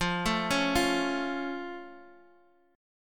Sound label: C sharp Augmented, F